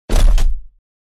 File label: Robot juggernaut footsteps single step